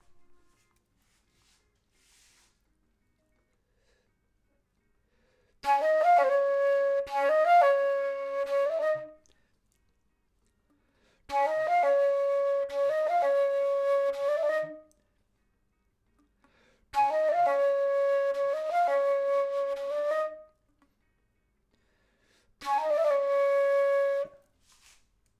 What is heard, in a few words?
flute; logic